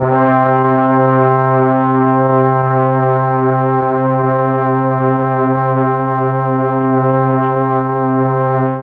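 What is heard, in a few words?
C3 half speed brass, mellotron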